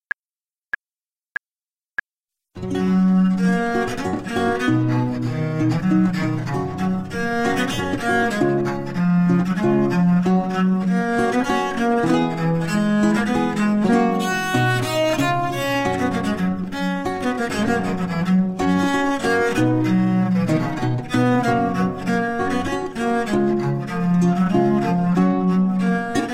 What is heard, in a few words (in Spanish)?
RECERCADA